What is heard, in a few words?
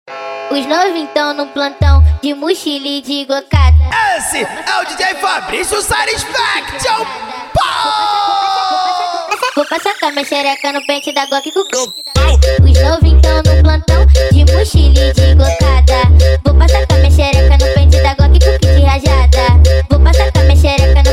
funk mega bass